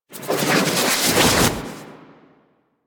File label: Melee Charge Up Generic; Warframe